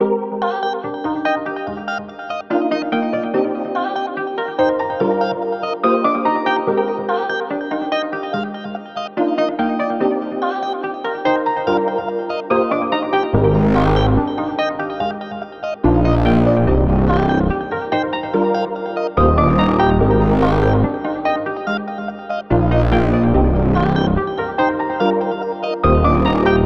144 BPM C Min, Melody; Cymatics; 2022 Melody Collection Platinum Expansion

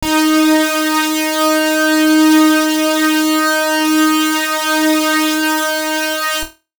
Lead Shot D#, Dubstep; One Shots; Style; Ghosthack; Dubstep Sound Arsenal

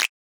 Snap; Drum One Shots; Snaps; Cymatics; Cobra Hip Hop Sample Pack

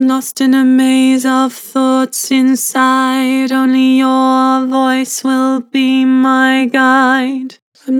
Vibrato, Vocals; Lost in a maze; Harmor Vocals

